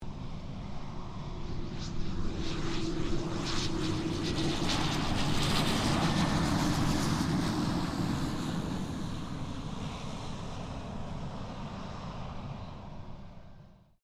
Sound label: 1985 PC-9A LAND, PC-9 Military Trainer; Airplanes; Vehicles